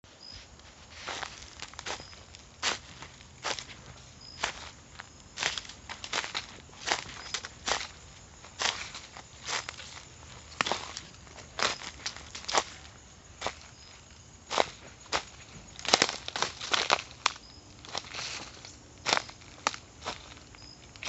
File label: Walking In The Woods Crunching Sticks Underfoot